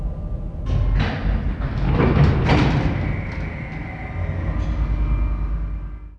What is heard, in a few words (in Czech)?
trezor; Mafie 1